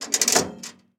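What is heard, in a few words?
turnstile sounds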